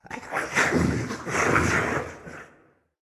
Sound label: Holdthedoor Zombies